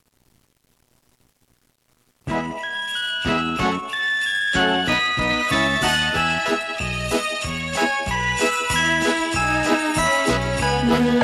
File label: jingle bells